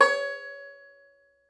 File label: Tenor Banjo; E-MU Producer Series Vol. 7 – Old World Instruments; Plucked Strings